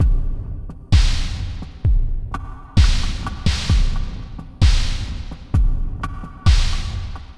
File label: Octave Down Loop 130BPM, DrumLoop Ghost Layers